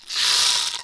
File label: box rattle; Kenya Box Rattle